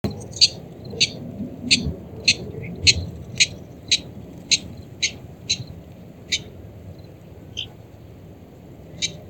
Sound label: aztec rail, birds